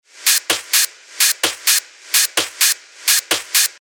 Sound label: 128 BPM; Spray